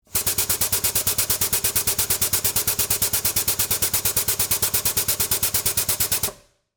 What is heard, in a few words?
Pneumatic Floor, Air, Burst; Scifi, Pneumatic, Air, Burst, Science, Fiction; Spacecraft; BlastWave